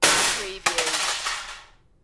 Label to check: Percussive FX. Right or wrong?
Wrong